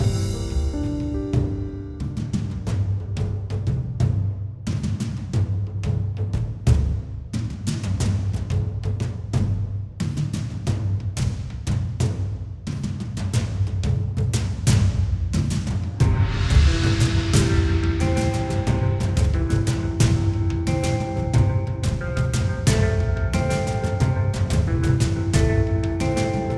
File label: Quest Base Loop; bakugan champions of vestroia 2020; switch gamerip